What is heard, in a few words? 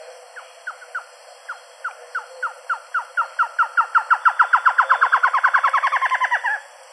trogon melanacephalus; bosque seco